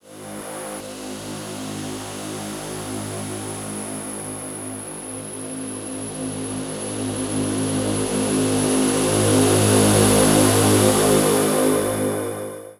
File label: INTERSTELLAR BUZZ DRONE, DEEP ATMOSPHERICS; Sonic Foundry (Sony Creative Software); Vir Unis Infusion